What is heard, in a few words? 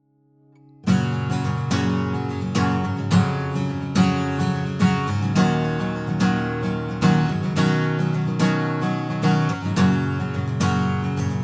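guitar strum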